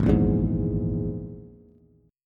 Ab Suspended 2nd Sharp 5th Ab Bass; strummed; JGuitar

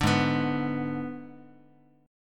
Ab Diminished, Guitar; strummed; 5,4,2,x,x,x; 4A,4E,5A,5D,5Gsharp,6Csharp

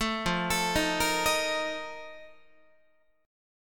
F 11th F, down, Guitar; F11 arpeggiated; 12,13,12,13,14,14

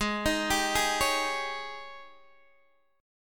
Ab Major 7th Suspended 4th Ab down Guitar DADGAD

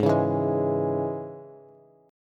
Ab Suspended 4th Sharp 5th Bass; strummed; JGuitar

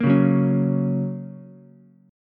A sharp Minor Sharp 5th down Ukulele, A#m#5 chord; strummed; JGuitar

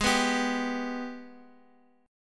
Gsharp Minor Major 7th, Guitar, Half Step Down

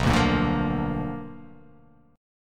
B-Minor Major 7th Flat 5th B down

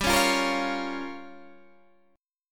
G Minor 7th Flat 5th, Guitar DADGAD

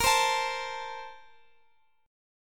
A sharp Major 7th Suspended 2nd, Guitar, Open F; strummed

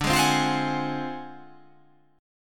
D 7th Sharp 9th Flat 5th D down, chord

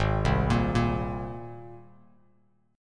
G sharp Major Add 9th G sharp, Bass Standard; G#add9 arpeggiated; JGuitar

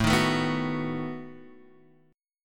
B 7th A

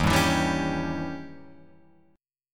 Eb 7th Flat 9th, Guitar, Open F; 3,2,4,2,4,2; Eb7b9 strummed; JGuitar

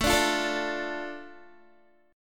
A Suspended 4th Sharp 5th down Guitar; Asus4#5; strummed

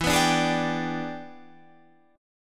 E 7th Sharp 9th Guitar Half Step Down, chord

